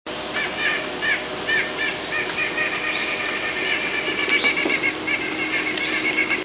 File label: nuthatch white-breasted; birdsongs